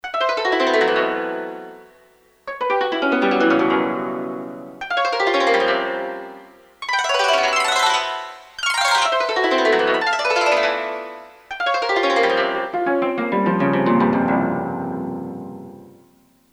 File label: KOTO DOWN, Korg DSS-1